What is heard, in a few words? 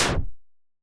shoot